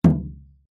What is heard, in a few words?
instrument drum gamelan hit single